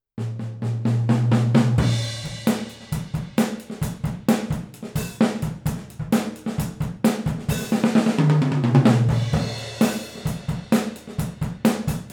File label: xy omni, oh positions